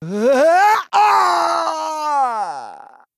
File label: Male Human Death